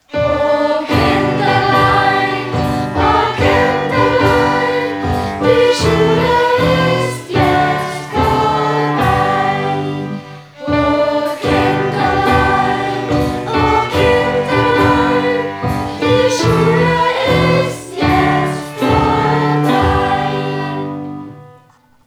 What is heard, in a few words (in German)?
pausenglocke oh kinderlein